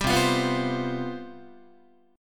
C Major 7th Suspended 4th, C, down, Guitar; CM7sus4 strummed

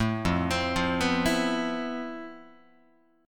F Major Sharp 11th, Guitar; arpeggiated; JGuitar

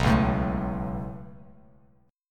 B 7th Suspended 2nd, Bass Standard; B7sus2; strummed; JGuitar

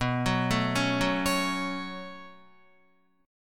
B 7th Suspended 2nd, down, Guitar; B7sus2; arpeggiated; JGuitar

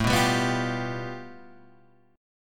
A 7th Suspended 2nd Sharp 5th

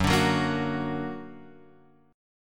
F sharp Augmented F sharp Guitar Open F; strummed; x,1,2,1,1,1; JGuitar

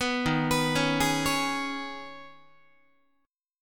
Eb 7th Suspended 4th Sharp 5th Eb, Guitar; 14,11,14,11,12,12; 4A,4E,5A,5D,5G#,6C#; arpeggiated

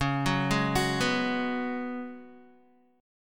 C sharp 7th Suspended 4th C sharp, Guitar; C#7sus4; arpeggiated; tuning=4F,4A,5C,5G,5C,6E; guitar chord

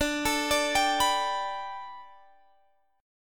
A 7th Suspended 2nd Suspended 4th

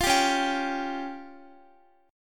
C sharp Major Flat 5th, Guitar; C#Mb5; strummed

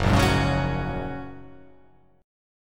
Gb Major Add 9th, Gbadd9 chord; strummed; JGuitar

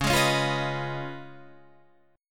Db 9th, Guitar, Open D

